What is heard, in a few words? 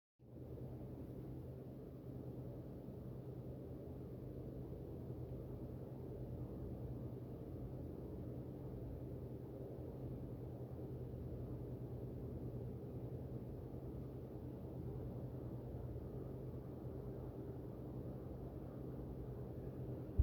backnoise; Ten2Zero